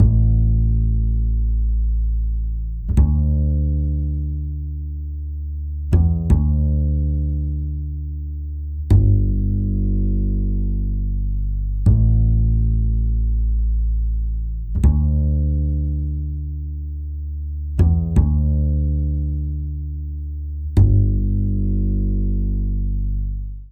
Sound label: Bass Dyn EQ